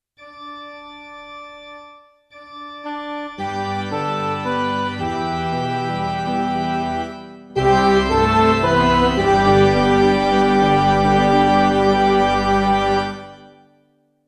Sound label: mass scripture affirmation midi